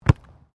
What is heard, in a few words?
RUGBY BALL DROP KICK; Various rugby sounds; Miscellaneous Sports; Foundation4